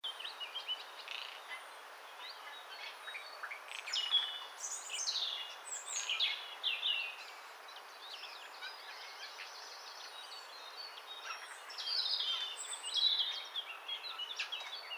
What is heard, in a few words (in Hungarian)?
szajkó hangja